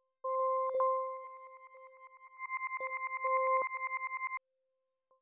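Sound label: granular kalimba accent C major; accents; bespoke - Floral v.2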